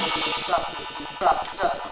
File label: TRAILER BREAK 124 bpm; DANGEROUS TRAILER BREAK; 90 s Sample CDs; Planet of the Breaks